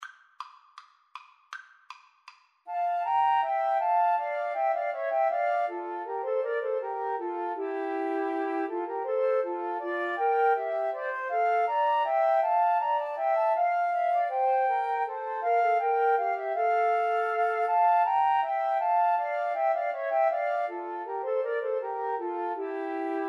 bourree, 2 Flutes Clarinet

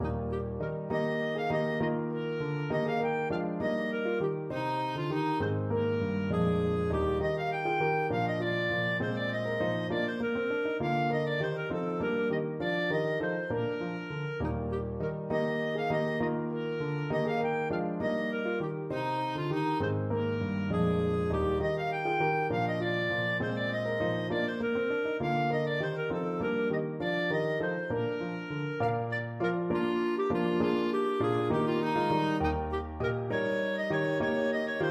handel suite gig, clarinet

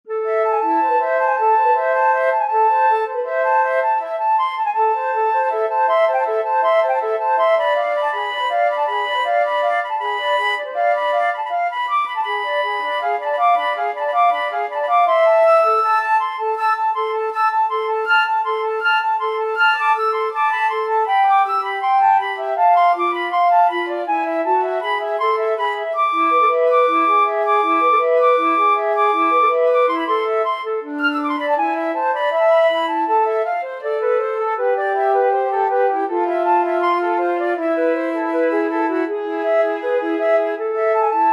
rappel oiseaux, flute duet

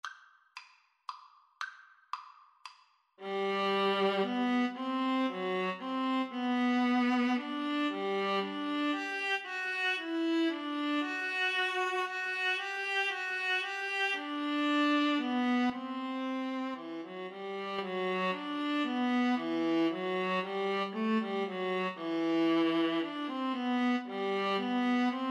Violin Viola Duet

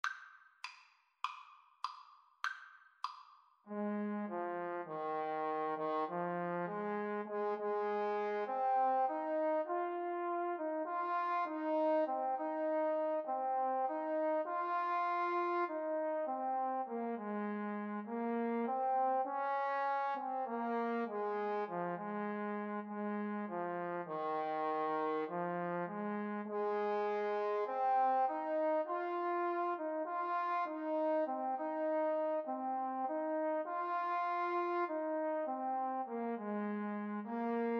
mountain thyme, Trombone Duet

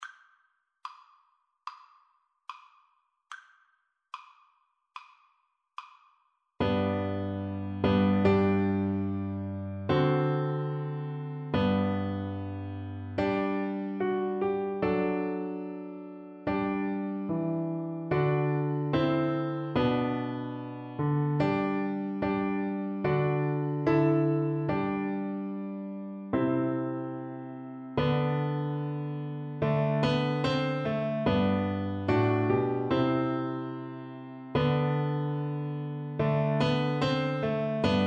ocarina, Ocarina - Four Hole

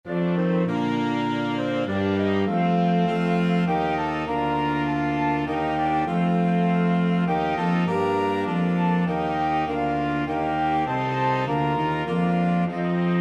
flexible mixed ensemble, 4 players

